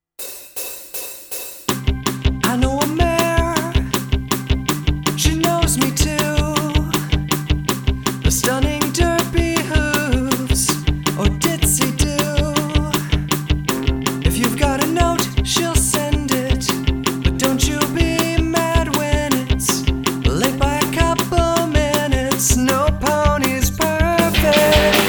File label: Rock Cover; Jackle App